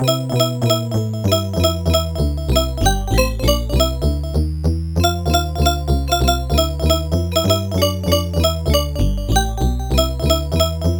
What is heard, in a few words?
JingleBells MIX; MaxTrax